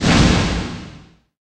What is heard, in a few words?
sunpanelclose; ROMAN